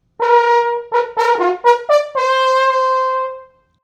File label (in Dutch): hoorn